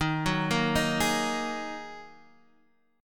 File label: Ab 7th Suspended 2nd Eb